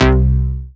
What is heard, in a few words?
synth note analog C, one shots; Virtual Riot; analogboi